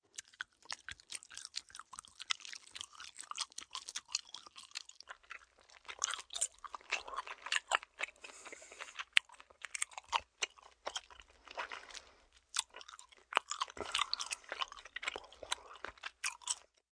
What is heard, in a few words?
Eating Chewy, Eating chewy food, or gum; human voice; Eating Sound Effects